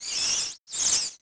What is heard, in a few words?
window loop; Cars; XBOX